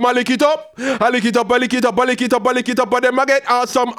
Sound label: ragga in B phrase